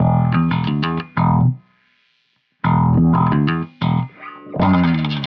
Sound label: Bass, Wah; Bass X